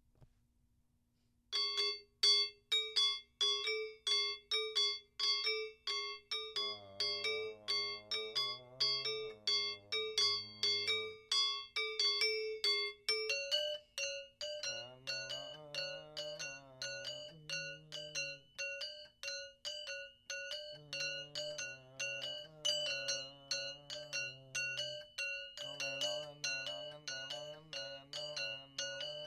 Lengker kotekan into transition polos, gamelan

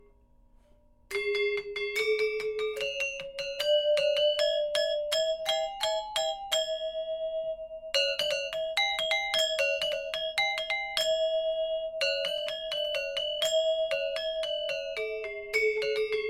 Kebyar slow, gamelan; Kebyar to SLOW

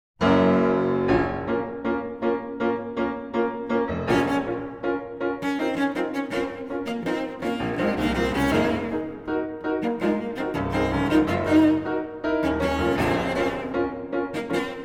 for cello piano; V. Song 1

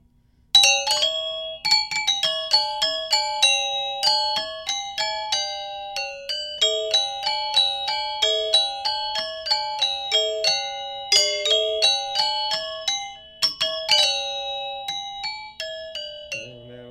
Goak Maling Taluh intro, gamelan

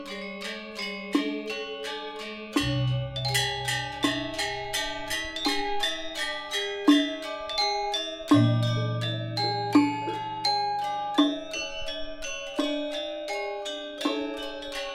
slow section gangsa, gamelan; PSemirang; Punyacah

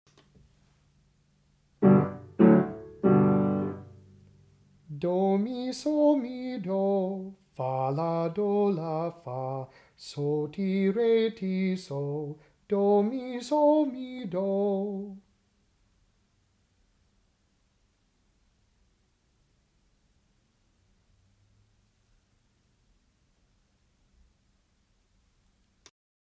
I-IV-V-I Arpeggiated Progression

